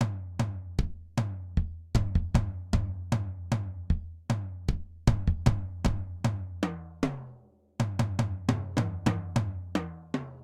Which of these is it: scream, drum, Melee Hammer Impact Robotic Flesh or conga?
drum